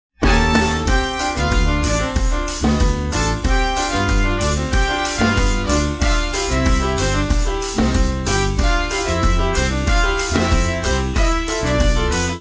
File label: Jazz